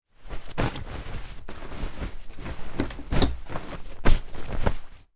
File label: household duvet flatten tidy bed